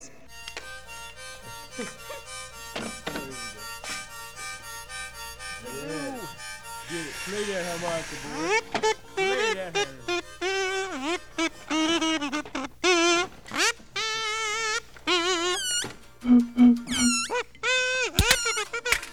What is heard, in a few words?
harmonica